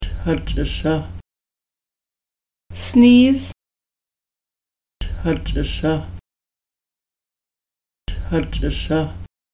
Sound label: Sneeze